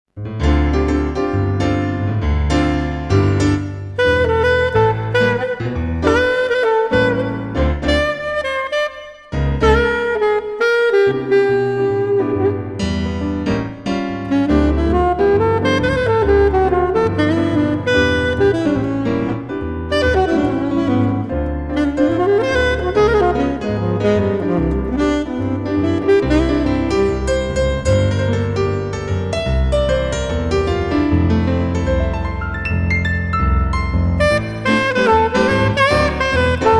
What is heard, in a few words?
sax